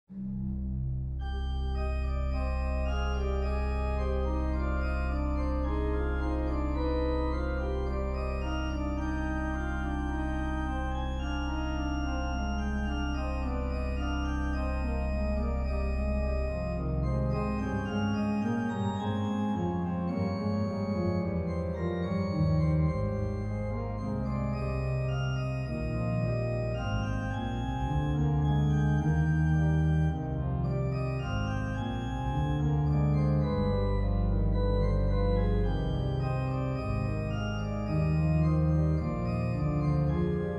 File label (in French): Fantaisie Ut mineur, organ; Friesach